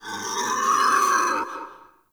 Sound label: Forest Open Glade Animal Far, Day; Ambience